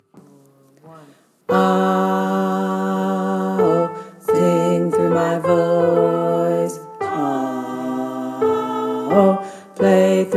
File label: Bass; The Freedom Choir